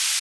crash

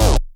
bass

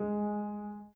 Piano Chord

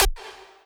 lead

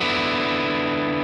D6add9

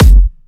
soft hit whistle; osu

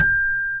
Celeste G4

